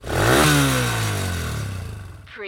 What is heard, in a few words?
Motorcycle Dirt Bike Rev